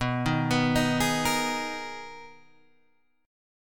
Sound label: B Major 13th B Guitar Open G